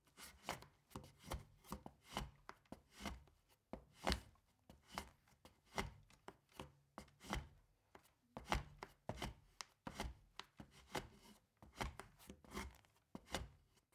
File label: step ladder wood scrape; schritte